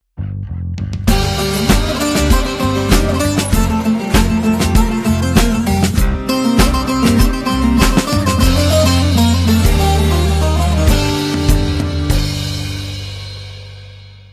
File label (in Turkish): saz